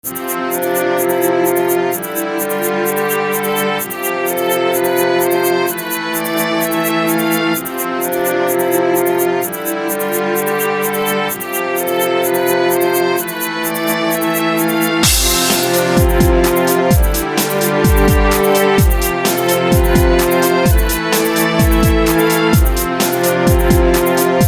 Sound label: Instrumental